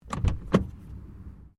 CAR TOYOTA COROLLA DOOR OPEN INTERIOR, hatchback; Car Toyota Corolla 20 09; Cars (Sound Effects:Vehicles)

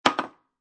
Foley, Aerosol Can Drop Knock Over On Table; Knocks

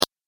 Analog Click

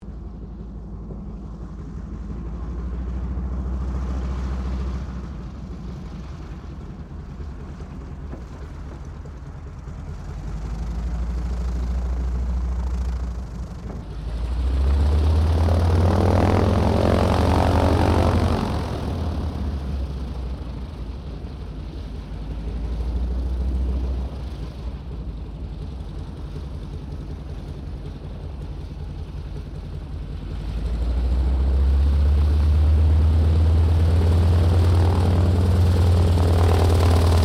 AIRCRAFT AT 6D HARVARD MK III TAXI SHUTDOWN; Temora Harvard MK III; Airplanes

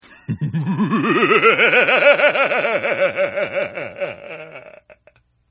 Laugh Evil Dry; Evil Laughs; Horror; Partners In Rhyme

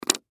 Pen Drop Wood Desk; Pens; Offices; Blastwave

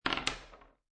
Dice Throw One Die On Wood Table; Dice Sound Effects; Casinos; Lynne Music

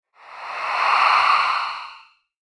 Beware Of The Banshee, monster sound effect

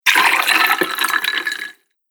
Foley Pouring Drink With Ice; Drink Mixing Sound Effects

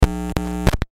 Digital Power Cut; Noise; Electronics; Blastwave

